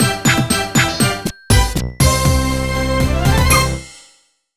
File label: Jingle WIN BPM100; Super Scribblenauts 2010 NDS; LVL1WIN